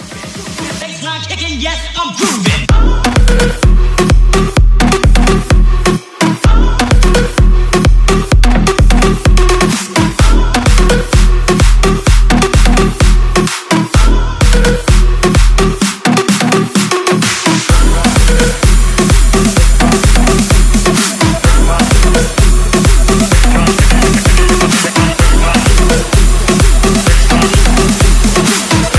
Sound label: EDM SICK DROP